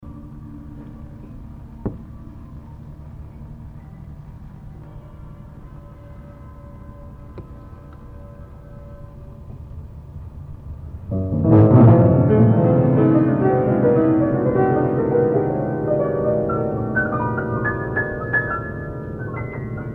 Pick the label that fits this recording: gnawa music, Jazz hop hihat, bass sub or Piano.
Piano